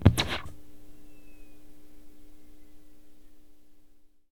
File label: Computer Powerdown Mac; SFX Kit; Foley - Misc